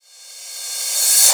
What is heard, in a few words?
CRASH, REVERSE; Drum Hits